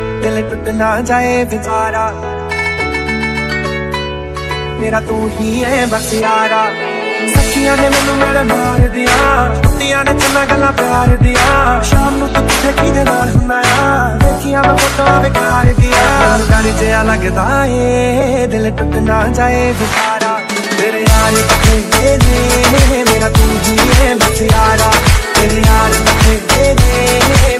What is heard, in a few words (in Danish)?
ny sod engelsk ringetone